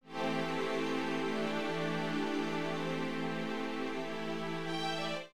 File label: string